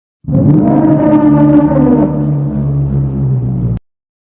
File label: prowler sound bass boosted